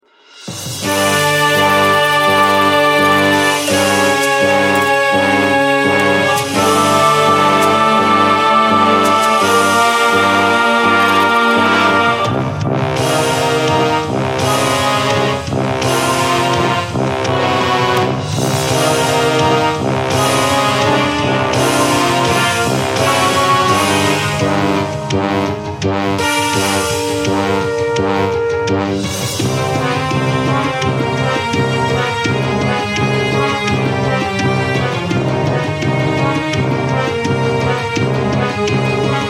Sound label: Bgm Fantasy